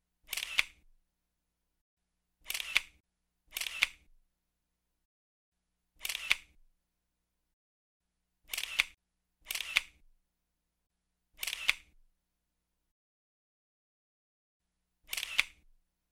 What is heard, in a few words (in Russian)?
SCHelchok fotoapparata